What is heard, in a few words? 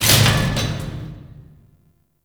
Elevator Start; Ambience; Props; Gemini; Warframe; Entrati